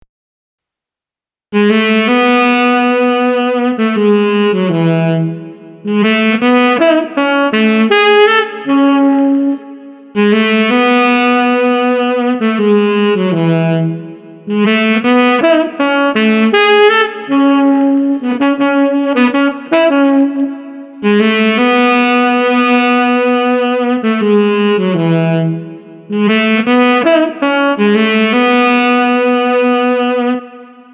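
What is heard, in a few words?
Street Tenor Sax Laidback, 80 bpm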